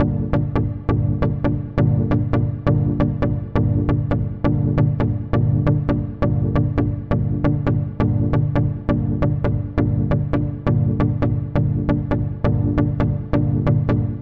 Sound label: 135 moody techno chord; SYNTH AND ELECTRONIC LOOPS